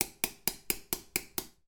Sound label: razor disposable tap on sink remove hair